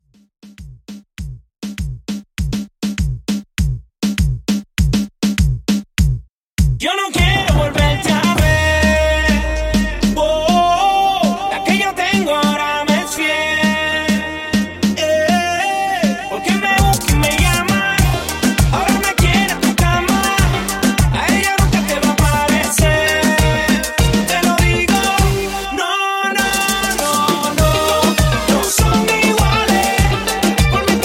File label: Reggaeton 100 Bpm